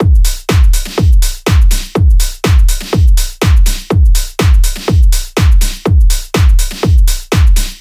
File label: drums 123, Full Drum Loops; drum sample pack; Kelut; SEISMIC; Slate